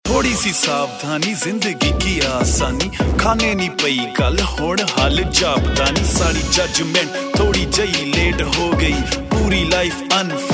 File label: Rap Ringtone, Punjabi